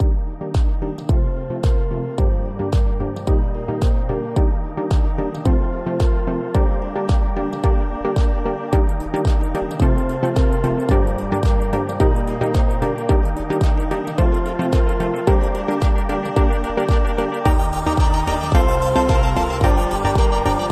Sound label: Four on the Floor 110bpm Alt Percussion